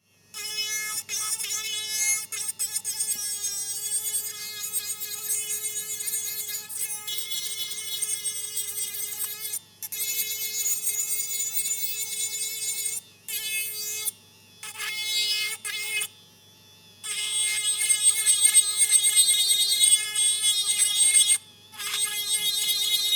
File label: dental drilling